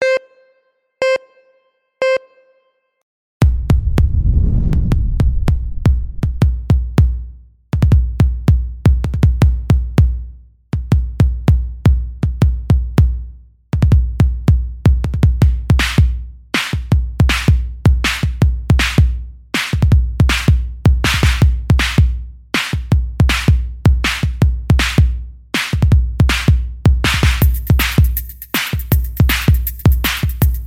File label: Jumper Breakdrum